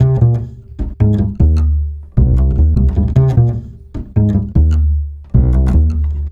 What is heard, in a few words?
Acoustic Bass